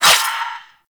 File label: Melee Swipe; Enemies; Warframe; Duviri